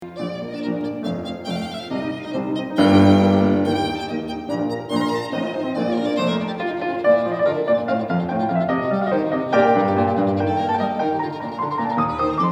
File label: violinist